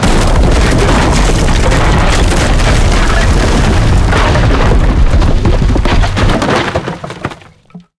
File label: wood building fall big; tanks; War Thunder